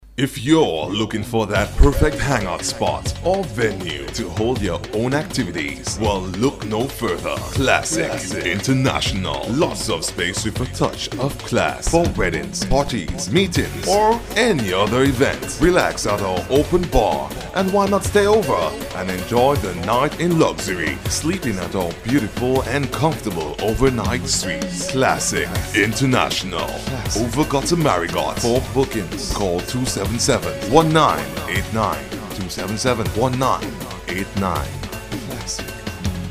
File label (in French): classique general